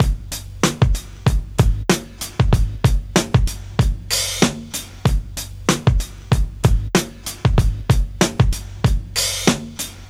Old School Break, Drums

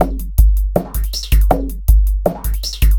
Electronica Drumloop; Drums

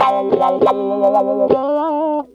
Electric Guitar, Loops; Guitars

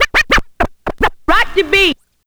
Turntables

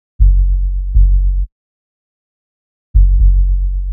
Kick Loop, Drums, Dance Beats

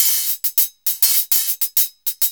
JAZLOOP; SoundFactory; DRUMLOOP

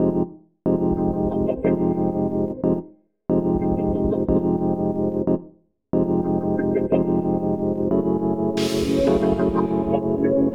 Electric Piano, Loops, Keys

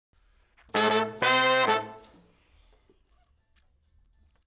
Horns Section

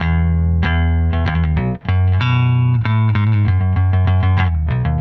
Electric Bass; Basses

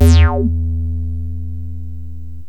bleep bass, yamaha rm1x synth

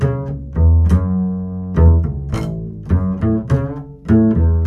Acoustic Bass, Loops; ACID; Basses

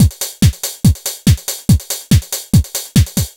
Dance Drumloop; Drums; Dance Beats

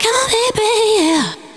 vocals; SoundFactory; SMPMANIA

